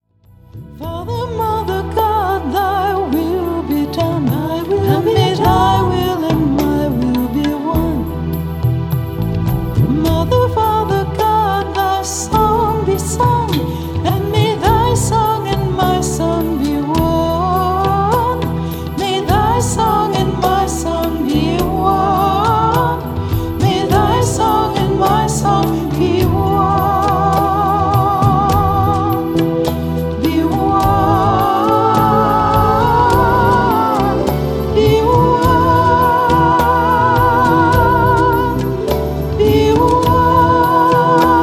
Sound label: Chakra Healing Chants